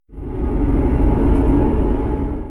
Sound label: lift